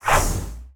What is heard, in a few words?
Swoosh Transition